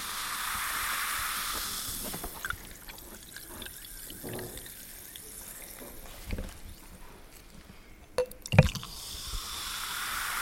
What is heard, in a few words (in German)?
Einfüllen in Glas; Wasserkraft Einfüllen in ein Glas; Klangexperimenten